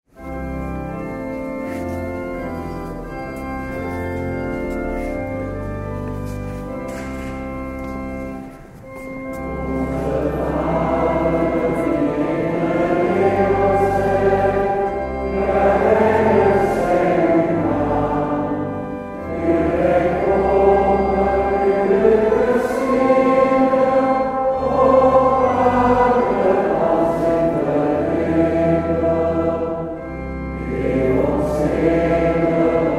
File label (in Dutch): Onze Vader